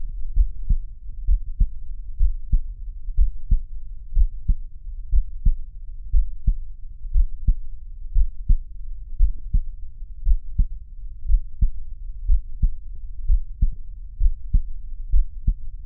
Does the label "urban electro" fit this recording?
No